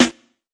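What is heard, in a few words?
classic hip hop snare; STYLE KITS (Hip Hop, Etc); Classic Hip Hop Mega Kit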